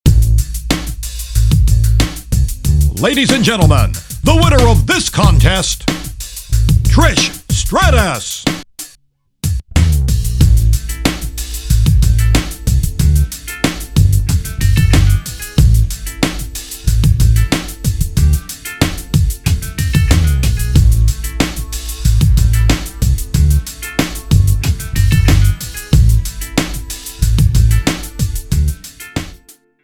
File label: Victory; WWE Crush Hour; Trish Stratus